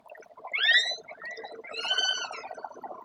Chirp trembling

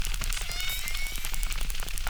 Chirp, acquarium

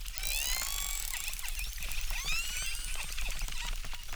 Chirp zap, acquarium

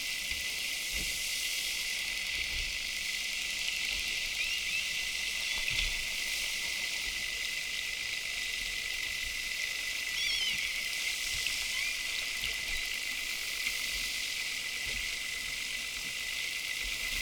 clicks

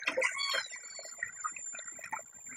Chirp constant

